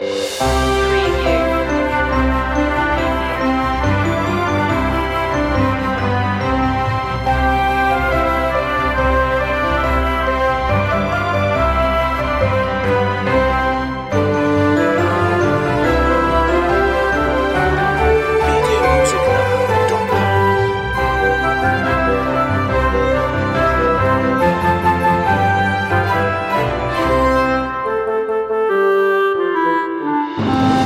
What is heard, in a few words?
orchestral loop; deck the halls; media music now